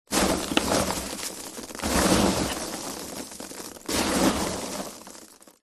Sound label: office foam packing peanuts in box hand in movement